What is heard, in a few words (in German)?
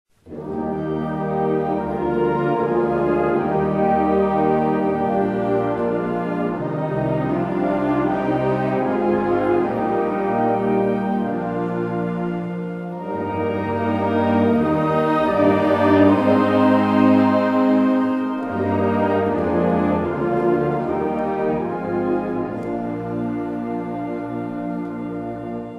Choral